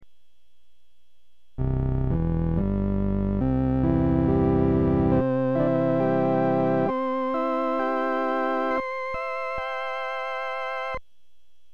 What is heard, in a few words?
Flute, Rheem combo organ